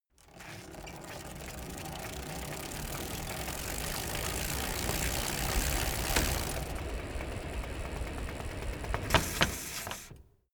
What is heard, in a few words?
bicycle rickety accelerate roll brake soft